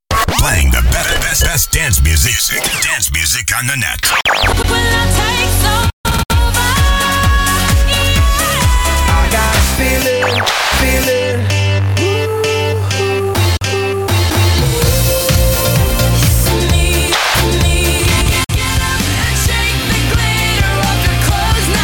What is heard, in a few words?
beatmix; dancemixusa